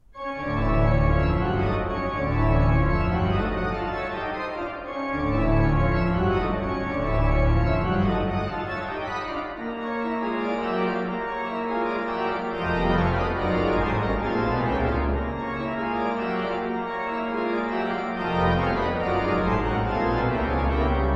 Organ; A Proclamation for Organ

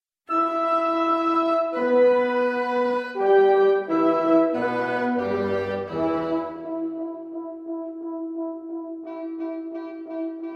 Quintet; in E Minor, op 88, no.1

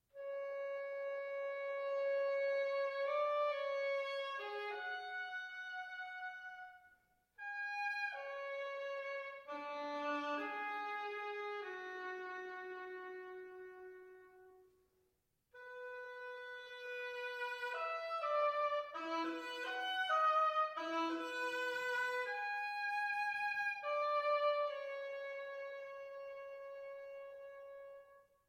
Woodwind Quintet; No.2, Mvt.2

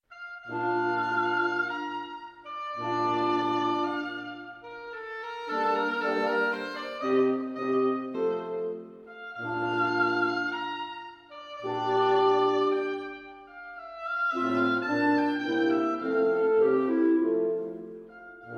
Quintet; in Eb Major, op 88, no.2

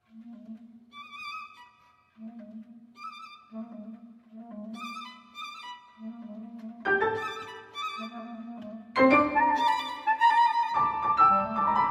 Trio; Mvmt III Escaping

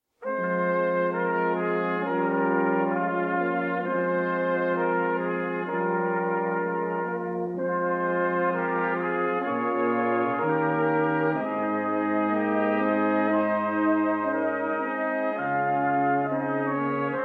Brass Sextet

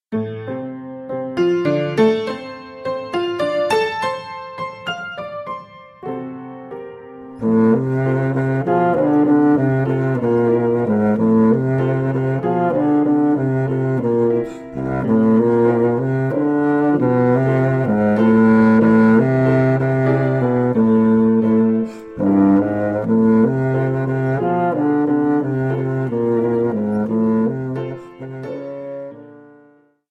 Ragtime Waltz; Pleasant Moments

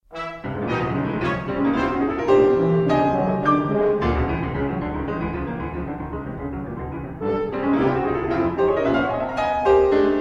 Piano and Brass Quintet, Allegro con Spirito; Concertino for Piano and Brass Quintet

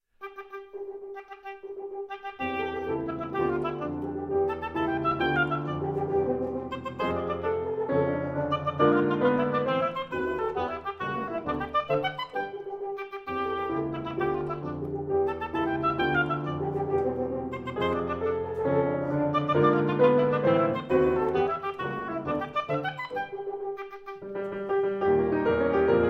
Trio; in A minor; Scherzo

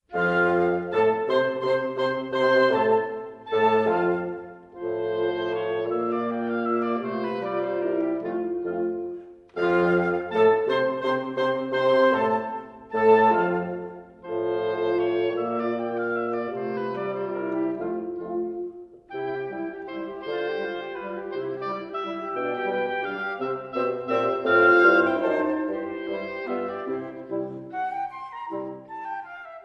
Quintet; Mvmt III

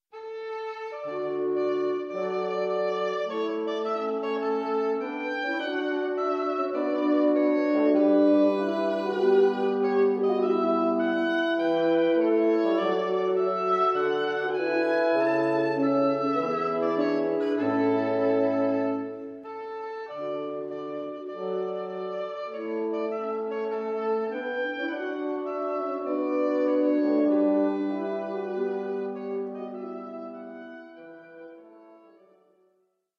Quintet; in A Minor, op.100, no.5, Mvt 2 Andante

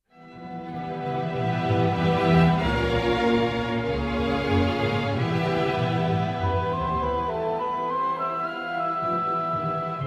Two pianos and Orchestra Moderato; Concerto

